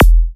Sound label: Club Kick